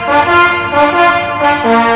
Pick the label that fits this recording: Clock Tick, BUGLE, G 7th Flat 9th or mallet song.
BUGLE